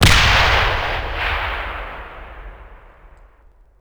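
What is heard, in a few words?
bazooka soldier fire; WAR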